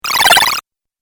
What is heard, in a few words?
8 Bit Style Magic Water; Video Game Sound Effects